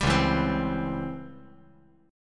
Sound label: C sharp Minor Major 7th Flat 5th, chord; C#mM7b5 strummed; 0,1,0,3; JGuitar